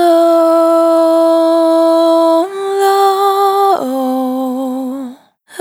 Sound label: Vapor Dry, Single Voice Melodic Loops